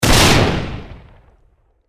Grenade Explosion Debris Blast; Grenades; Explosions; Warfare; Blastwave

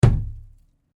Cardboard box hit

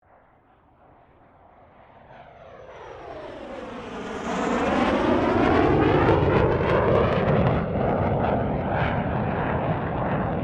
AIRCRAFT CA-27 SABRE PASS STEREO

